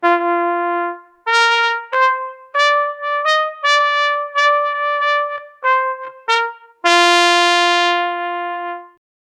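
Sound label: intro for a podcast, trumpet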